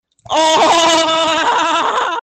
aahahahaahahahaha

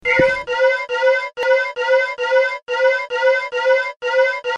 flag alert

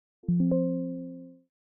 windows 11 backround

